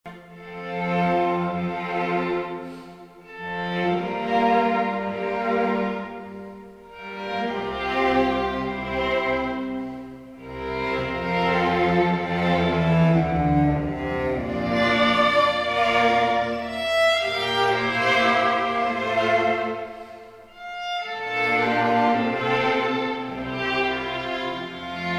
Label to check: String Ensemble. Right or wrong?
Right